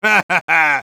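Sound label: Heavy laugh evil, Spanish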